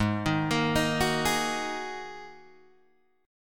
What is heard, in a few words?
G sharp 7th Suspended 2nd G sharp Guitar DADGAD